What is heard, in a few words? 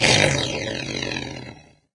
death, doom3 pinky